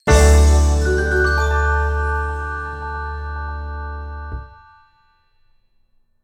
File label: Finish Night; Everybody 1-2-Switch!